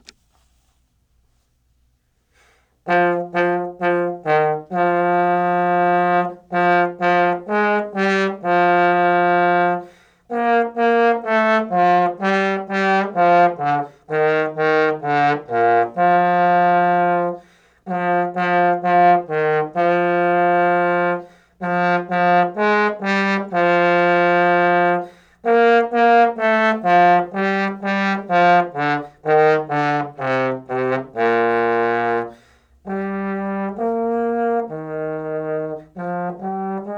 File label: March Trombone